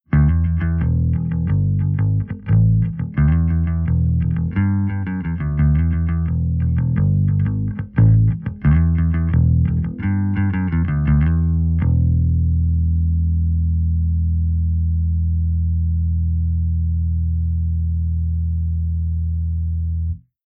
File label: SVT LOW HIGH